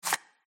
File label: card slide